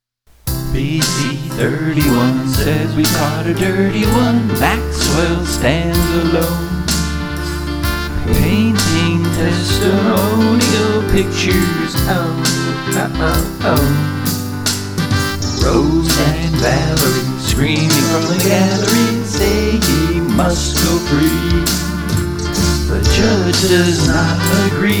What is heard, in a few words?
sing